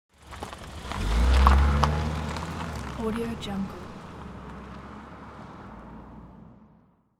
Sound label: car driving away